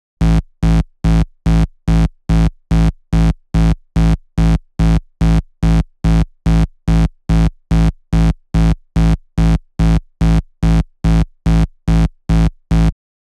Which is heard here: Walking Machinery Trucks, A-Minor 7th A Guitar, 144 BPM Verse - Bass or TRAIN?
144 BPM Verse - Bass